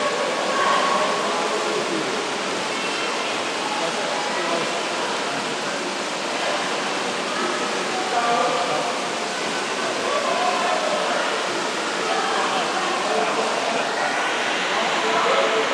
swimming lesson ambience

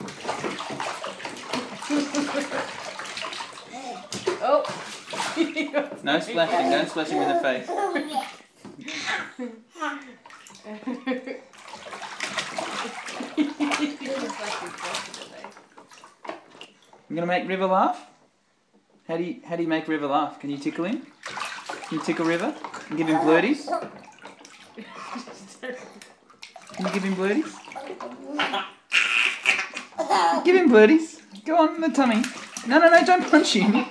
bathtime laughter

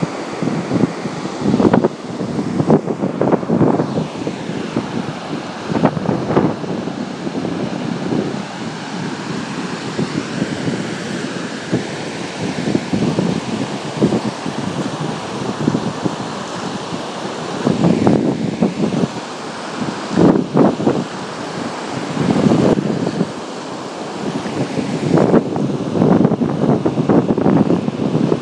byron surf

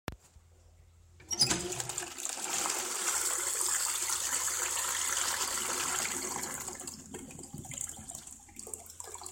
toilet

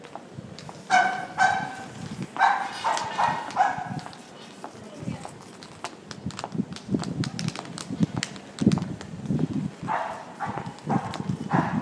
very noisy dog in Worcester market

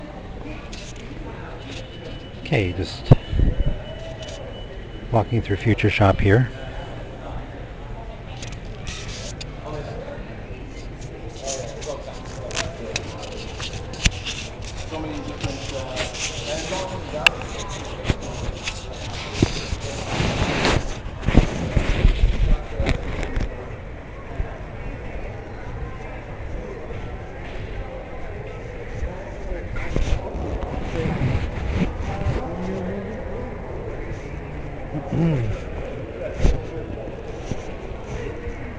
walking through ambiance; futureshop

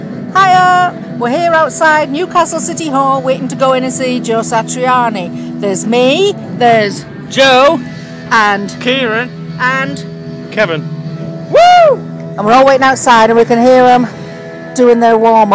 concert

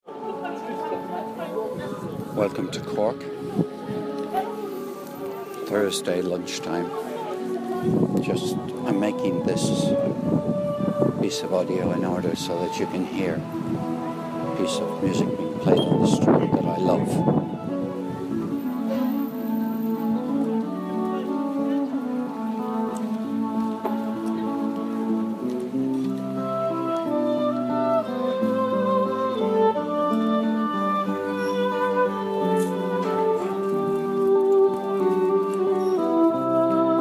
classical buskers in cork at lunchtime

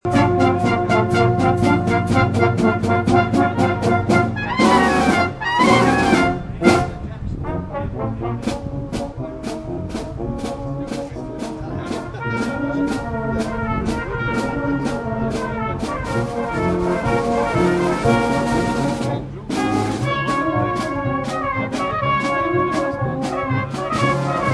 silver band; northallerton

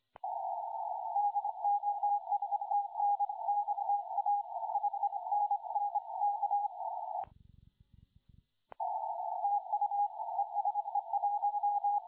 15m cw

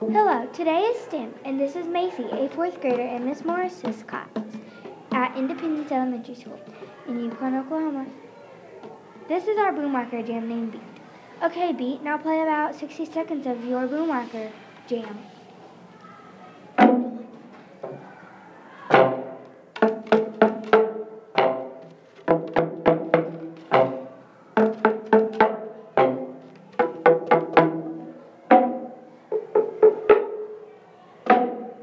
beats band

